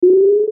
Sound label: Mouseover Electronic Ascend